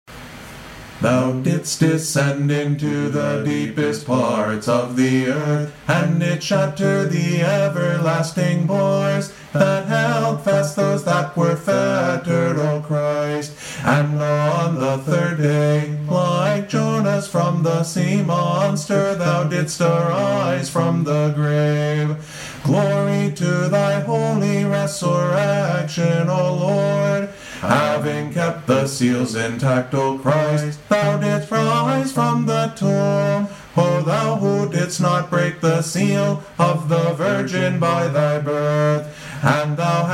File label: paschal canon ode